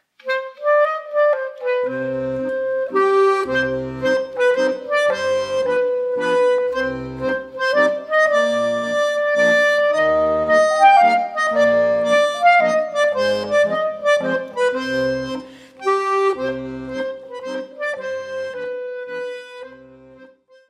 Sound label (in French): Passe-pied de Vieuxville, Belgique